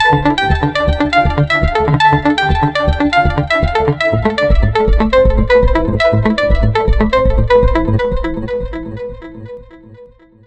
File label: beat of future